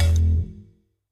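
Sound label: music food paradise suspense outro; rayman origins 2011 gamerip; food world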